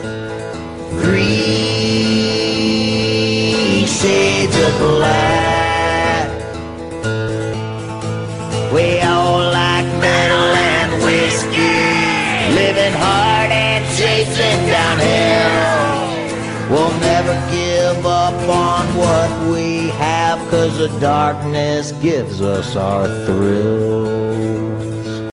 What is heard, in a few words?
Acoustic